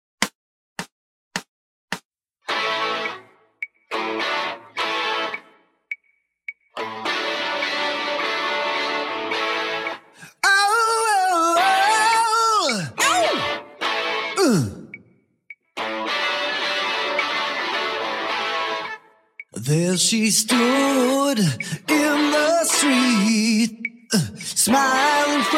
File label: 108 BPM NO CLICK; BACKING TRACKS DEBUT GRADE